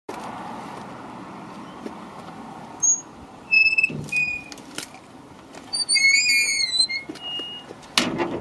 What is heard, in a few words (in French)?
PORTAIL; SOMMIERES